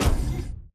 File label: footstep jog close; Titanfall 2